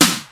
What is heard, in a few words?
original rock snare drum sound f key